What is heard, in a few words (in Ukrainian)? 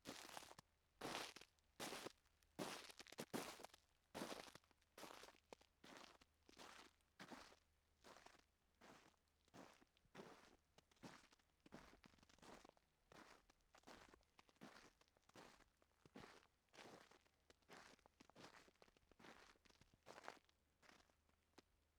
skryp snigu moroznogo ranku